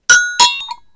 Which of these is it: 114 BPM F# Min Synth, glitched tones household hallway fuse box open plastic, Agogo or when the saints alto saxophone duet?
Agogo